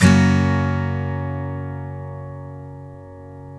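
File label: steel F# major down, CHORDS STEEL, Guitars; Spectrasonics; Hans Zimmer Guitars VOL-1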